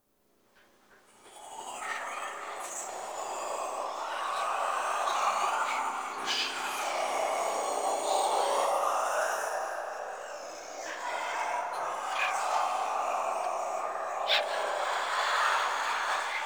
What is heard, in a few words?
Whispers; Muruk Vull; Warframe; Ambience; Entrati